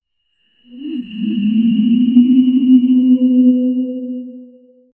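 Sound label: an ominous humming glitching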